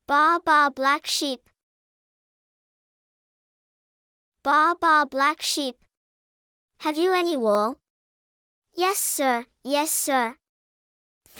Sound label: baa baa black sheep; Вірші для вивчення англійської для дітей